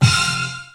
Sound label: electronic hit; TJ Metronome